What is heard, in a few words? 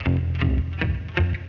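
electric guitar, ethno, 160; eJay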